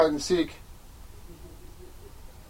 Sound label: laugh back closet; The first is the original but the laugh is hard to hear.